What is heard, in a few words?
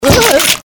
evil die; CAC ASSETS